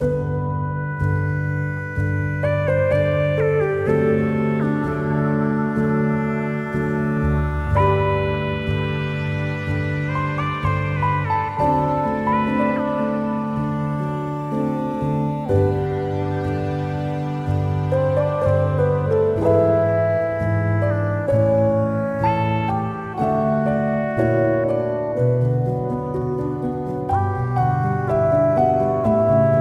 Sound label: Chorus; apico bee sides original game soundtrack